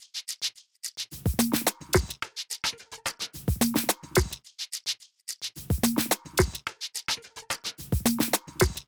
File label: Drum Loop 108 BPM Percussion, Afrobeats; Cymatics; GHOST (Beta Pack); Banished